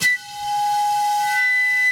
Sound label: bottle, ethno, 125